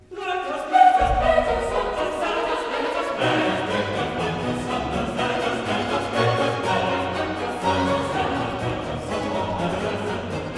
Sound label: Chorus; Handel Masterworks